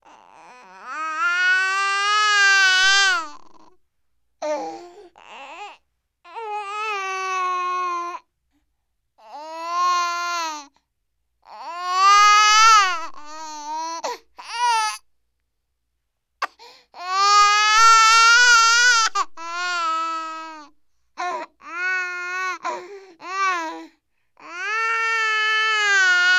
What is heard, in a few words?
Crying Female; Vocals & Wallas